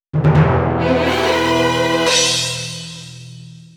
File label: power up; time chicken tracks